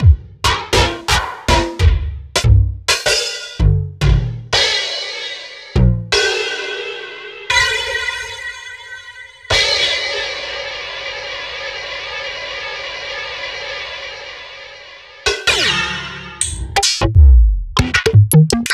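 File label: Roland MV8800 drum BREAK WILD KIT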